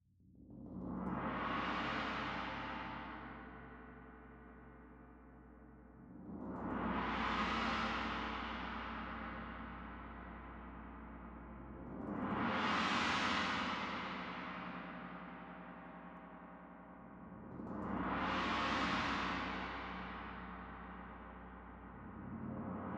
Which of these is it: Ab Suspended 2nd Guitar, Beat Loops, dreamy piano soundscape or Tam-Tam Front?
Tam-Tam Front